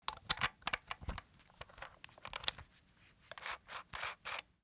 naradi; Mafie 1

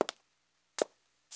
chuze; Mafie 1 cz

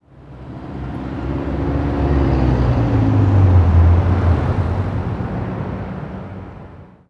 in autko; Mafie 1

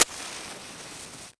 sirky; Mafie 1

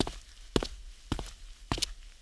kroky; Mafie 1

